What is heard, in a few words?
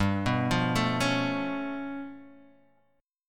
G 9th Flat 5th, Guitar DADGAD